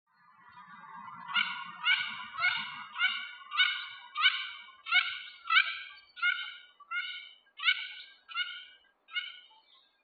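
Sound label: Mandarin Duck; hyogo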